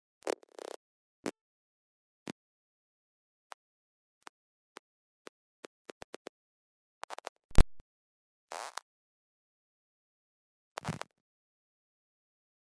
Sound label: kissing; stone and ripple